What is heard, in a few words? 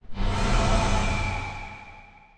nightmare glide; smite; thanatos